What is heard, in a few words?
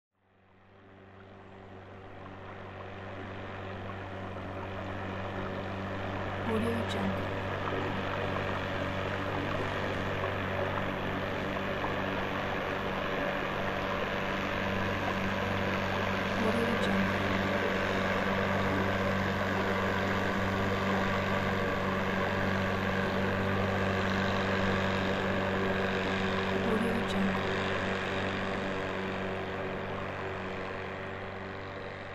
passing boat